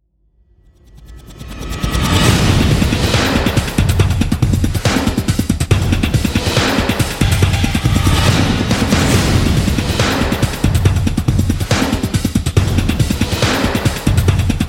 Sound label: remix drums